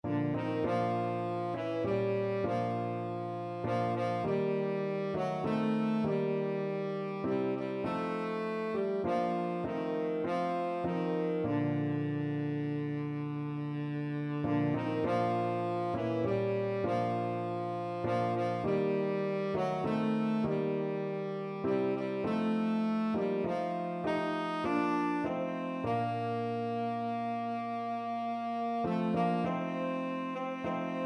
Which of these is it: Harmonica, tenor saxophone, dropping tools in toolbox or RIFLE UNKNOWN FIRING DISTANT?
tenor saxophone